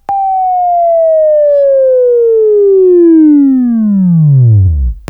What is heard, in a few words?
Buzz, Right; Sweeping Training